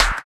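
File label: CLAP snare; East West - Phat & Phunky CD2; 90's Sample CDs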